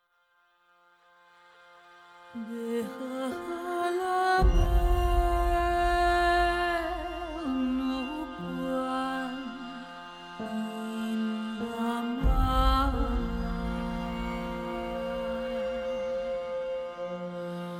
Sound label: Lullaby With Vox